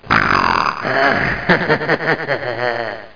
monstr; The Amazing Spectacular Ordinary Bottle

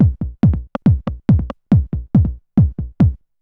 word, Techno Drums; TechnoMusicMaker

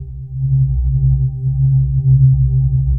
SYNTH D+B 160 C, Drum+Bass, Keys; eJay

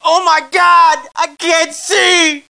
pain; SINDEMO

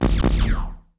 Laser; Guns; MicromanÃa 35

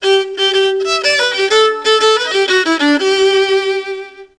viol

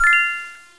sparkle

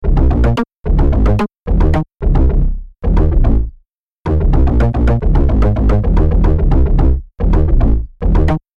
Bass Line

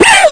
Killed Root; Apeiron

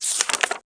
page turn; Macworld (1997-09); Games World; Imperialism Demo

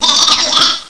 Laugh; Groucho; Apeiron 1.0.2

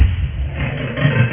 slide

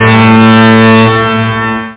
organ

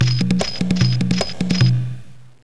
kick; Strategy Challenges Collection; Edmark Corporation; Zoo

